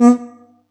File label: Yamaha EX5, Drums